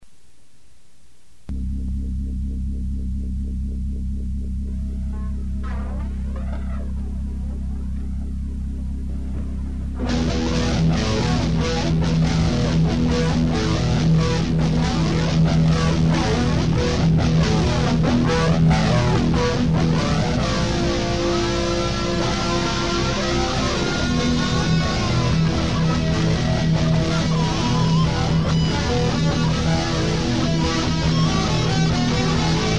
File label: Metal C